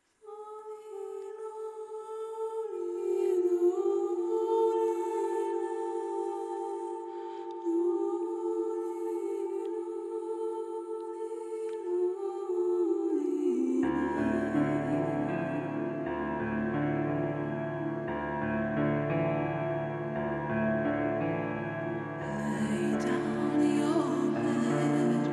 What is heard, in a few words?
Lullaby